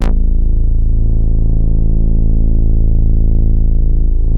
BOUNCE, BASSES

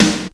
snare drum; Crypton - DrumBase5000; 52 SNARES

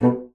B2, bassoon; Xsample VOL-6; C BASSOON

